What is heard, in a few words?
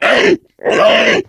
charger lurk, voice idle; player; source engine; left 4 dead 2